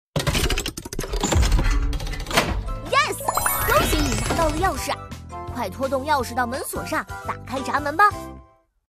open box

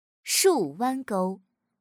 stroke

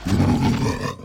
hit

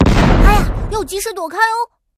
hit

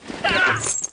hit

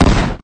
shot

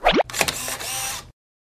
hit

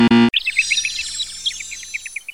wrong

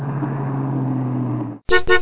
start

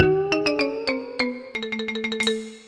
game fEnding

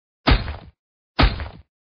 knock

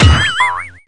hit

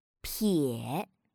stroke

